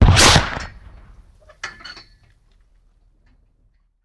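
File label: Cannon Cannonball; Sound Ideas; Guns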